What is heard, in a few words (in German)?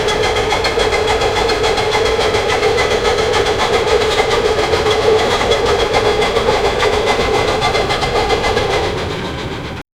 dampflokomotive gibt sign, trains